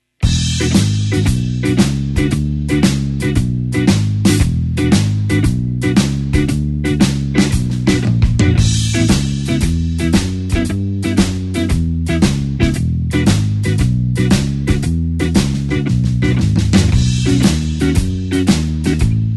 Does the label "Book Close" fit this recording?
No